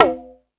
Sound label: Hip Hop, Drum Machines E-MU Pro-Cussion